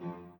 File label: piano